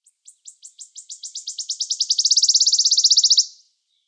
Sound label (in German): Waldlaubsaenger Phylloscopus sibilatrix; Vogelstimmen